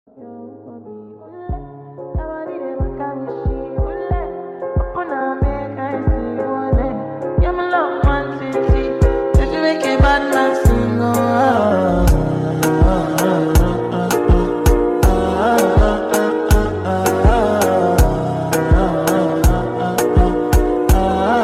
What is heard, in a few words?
Slowed